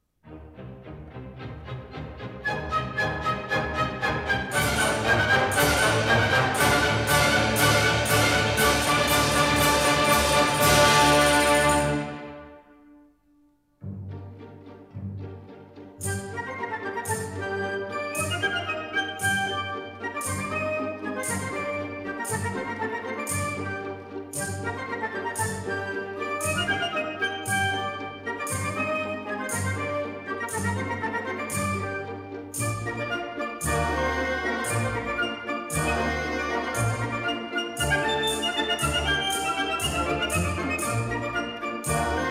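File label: Orchestre, Saint-Saens Morceau de concert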